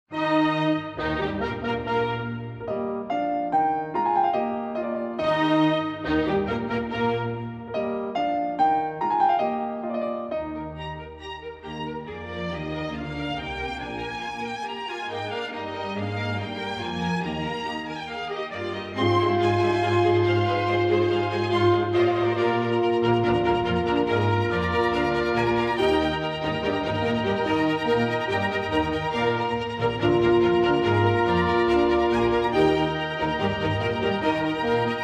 Concerto for Piano & Orchestra No. 9 in E-Flat Major, Allegro; K. 271; Jeunehomme; Mozart, Wolfgang Amadeus